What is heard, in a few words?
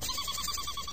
laugh helium; ooh ahh